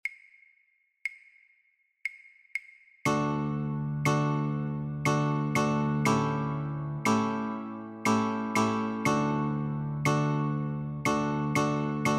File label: Chords only, guitar; Hickory Dickory Dock